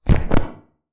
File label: footstep; Barotrauma; Barotraumatic; Crook